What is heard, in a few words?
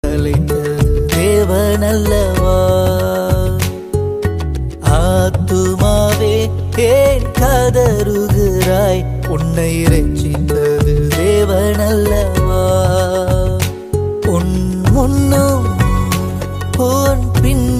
tamil soul ringtone